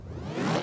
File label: Move